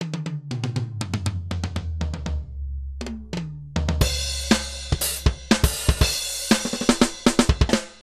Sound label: Panning Toms